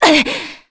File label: Damage, Vox; en; voices; heroes; Yanne; kings raid